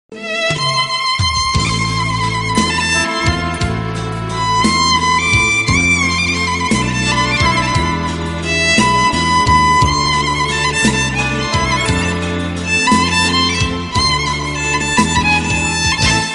arabic violin

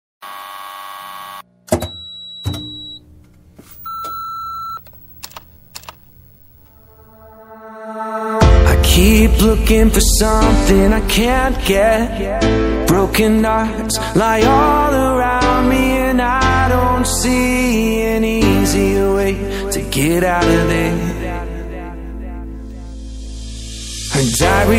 best hollywood english ringtone

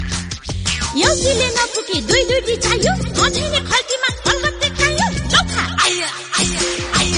nepali tone

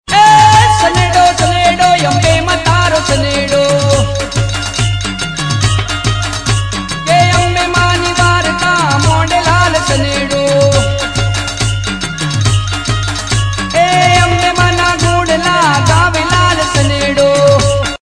sanedo sanedo gujarati ringtone

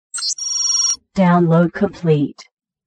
sms alert; ringtone

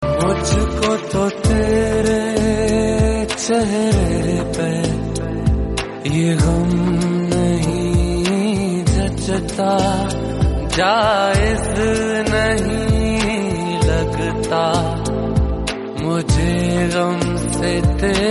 romantic ringtone

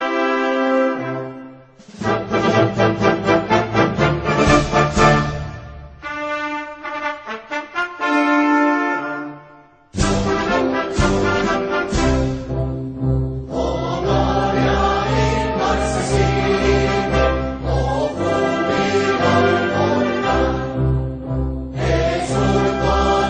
colombian national anthem

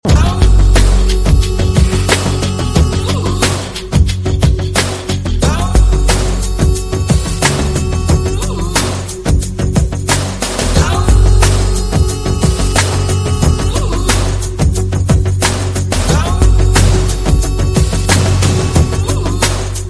movie theme tone; daud

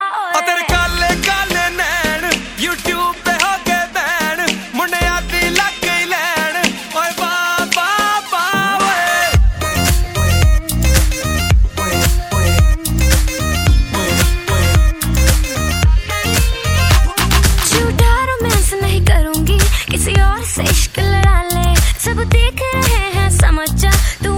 pakistani movie ringtone

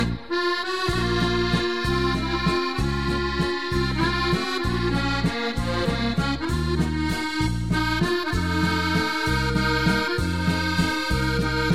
french accordion; ringtone

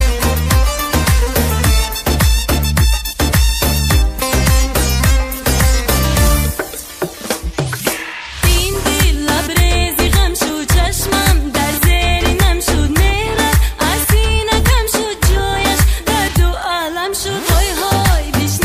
tajik ringtone

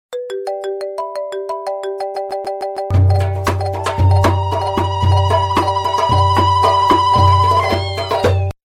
arabic iphone

